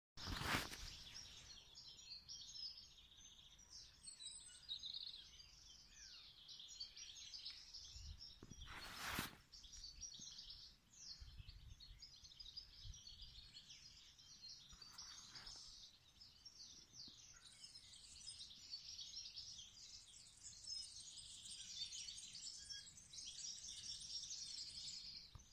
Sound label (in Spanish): Pajaritos; Valdelatas